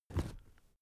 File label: cardboard box small lift handle; objekte